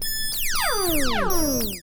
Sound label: SYNTH; E-Lab; X Static Goldmine 1